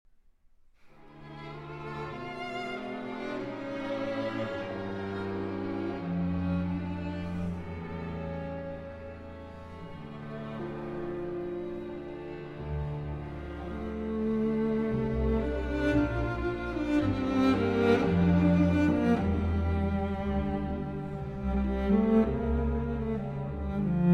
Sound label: Romance in D Minor; Elgar; Op. 62 (Arr. Parkin)